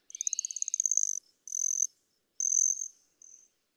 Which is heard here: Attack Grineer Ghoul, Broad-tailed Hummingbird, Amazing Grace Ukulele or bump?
Broad-tailed Hummingbird